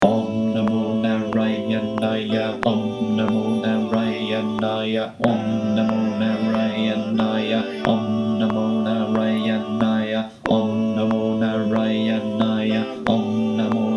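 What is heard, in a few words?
Bass, choir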